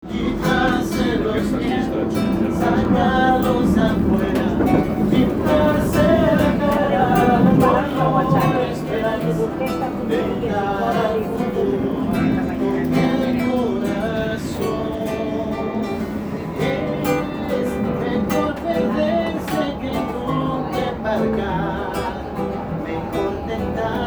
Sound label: barcelona train song